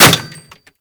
Bullet Impact Metal No Whizz; BulletImpacts; DE; Warframe

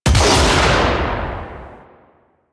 Finisher Shotgun Shot; Symbilyst; Warframe; Quests; The New War; Kahl; Weapons

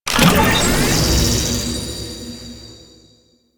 Hack Minigame Success

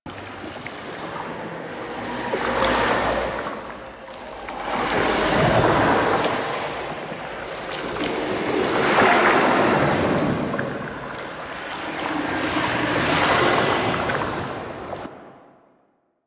Void Energy Particles Loop; Warframe; Ambience; Entrati

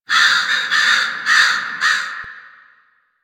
Fall Crows; Warframe; Ambience; Nineteen Ninety Nine; DE

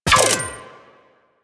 Fire Mech, Weapons Lever Action Rifle; Warframe; Tenno; Sybaris Prime

